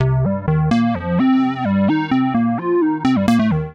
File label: Solo (Cm) 128bpm; Music Loops; Squared Up Solo; Ableton; Factory Packs; Singularities